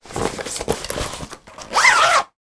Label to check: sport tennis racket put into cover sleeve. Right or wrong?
Right